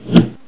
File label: THUNK